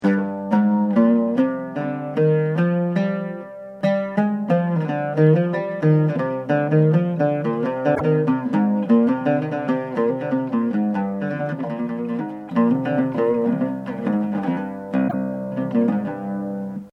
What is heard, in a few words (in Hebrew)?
כורד, מאקם ומוזיקה ערבית